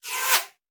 Bullet Pass By; Sound Ideas; Guns

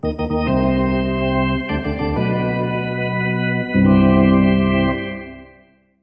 Hockey Organ

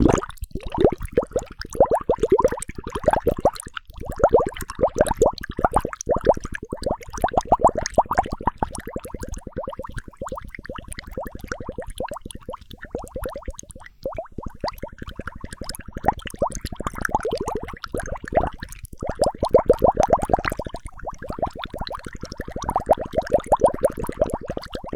bubbles; liquid; NS Studios - ultra sound collection

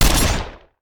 Shotgun; Sound Ideas; Guns

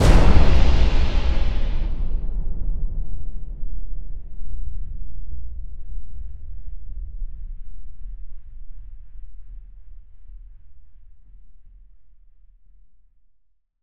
sting, Cinematic Metal Impacts; boom library

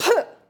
Boxing Female Spit Mouthguard

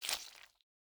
step gore; DeadFright

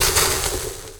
Drip Cauldron Molten Sizzle; SFX Kit; Foley - Misc

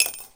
Coin Toss Into Tip Glass; Foley - Rattles

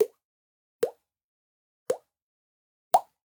Organic Mouth Pop; boom library; The Interface

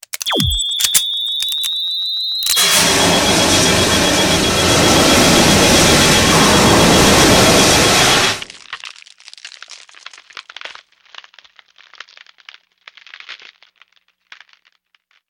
Gun, Freeze, Load And Blast; gun and weapon sounds; captain audio; fully loaded

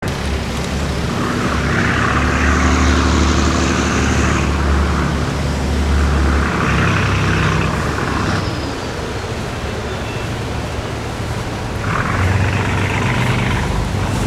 boat in 2 times; best service Blue Box; sea traffic

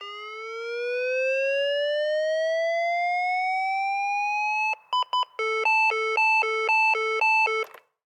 Defibrillator; Medical; Blastwave

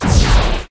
Menu Select

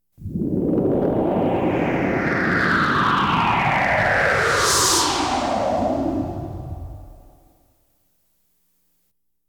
Science Fiction effects, Lasers, Light beams

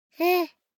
BABIES TALK Boy 12 months Huuhh; Baby Boom

